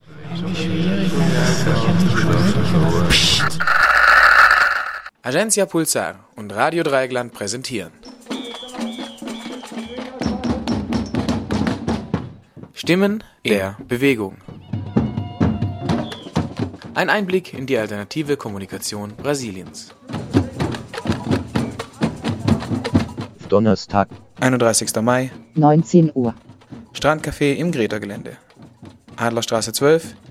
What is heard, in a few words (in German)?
Jingle